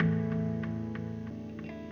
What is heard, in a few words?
ELEC GUIT ETHNO 125 A, Guitar; eJay